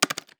Mechanical Keyboard Click

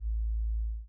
Clean Soft Bass

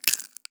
Small Dry Crackle

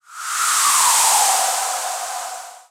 Synthetic Blow

